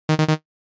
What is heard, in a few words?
Beep error